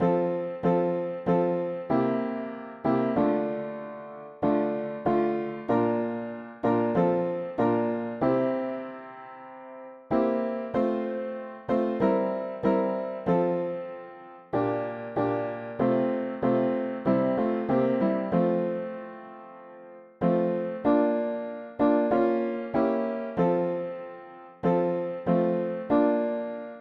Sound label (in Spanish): mono piano; hymns; Himnario pequeno